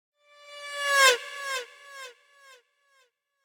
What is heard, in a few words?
Horn Trumpet Doppler By - Ominous Passing - Echo; Hollywood Edge; sound designer's toolkit v2